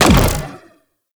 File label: Swarm Missile Fire; Weapons; Warframe; Sentinel; Helstrum